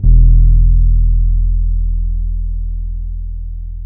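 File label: F 2, dark fretless bass; Spectrasonics; Bass Legends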